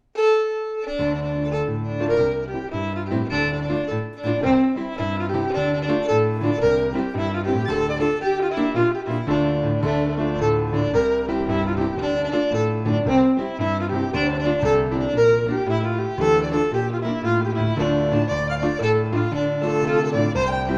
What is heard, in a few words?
FIDDLE PIANO